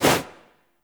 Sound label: Generic Weapon Light Aim Transition In; Warframe